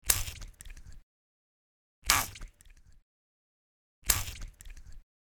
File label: Squirts